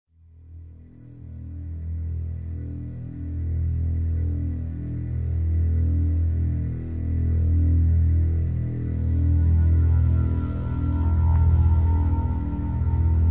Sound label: score sample; Voices from the High School